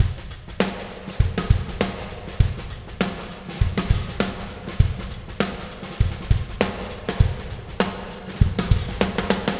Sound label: No Drum Reverb EQ